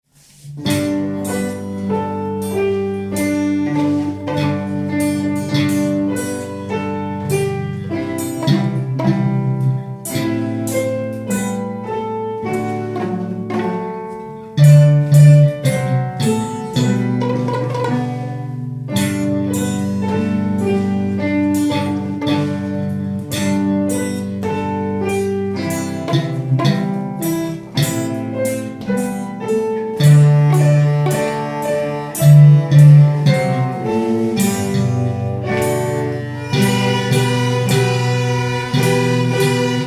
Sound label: music Jingle Bells